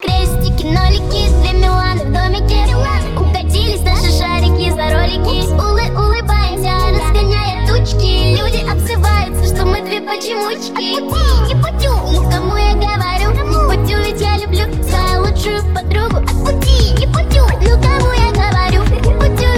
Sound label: dnb remix